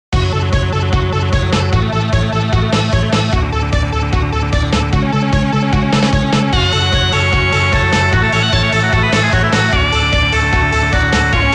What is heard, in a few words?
Retro; Dragon Ball FighterZ